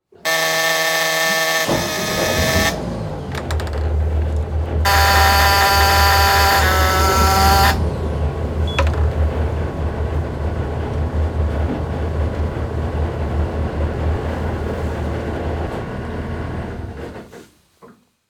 Transportation Police Boat Start Near Keys

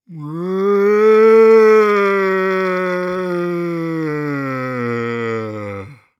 Horror Scary Undead Moan

